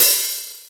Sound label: crash cymbal drum sample f key, Royality free drum crash sound tuned to the F note